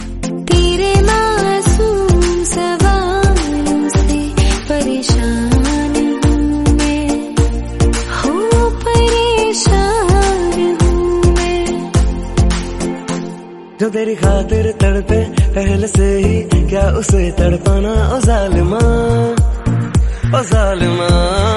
female version song tone ringtone, bollywood ringtones